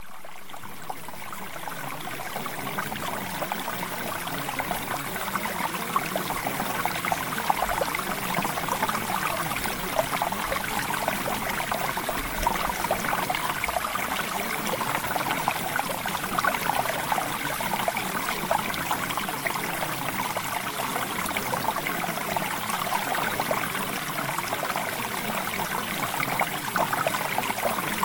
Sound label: Water Sample; OVERCOME ADDICTION; Premium Subliminals